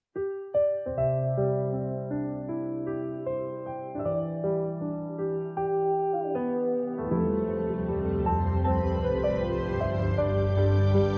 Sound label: simple love song drums mix